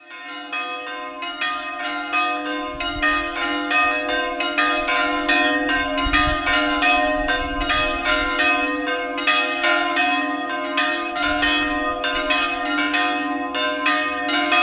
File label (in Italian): Redona, campane